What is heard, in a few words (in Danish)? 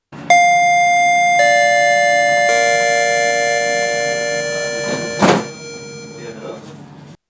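dd afgang, Afgangslyd DD-vogn; 26. juni 2018, Valby St.; Vilhelm Andersen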